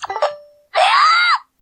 Wiimote sound; Toon Link

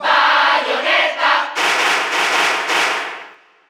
Cheer Spanish; Bayonetta; SSBU

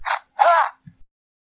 Wiimote Sound; Marth

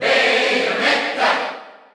Cheer Dutch; Bayonetta; SSBU

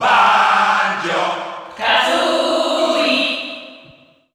Cheer Spanish; Banjo & Kazooie; SSBU

